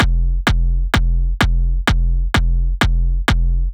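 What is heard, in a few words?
Drum Loop 128BPM bass drum F; VEC5 Drumloops; VEC5 Multi Loops; Loop Elements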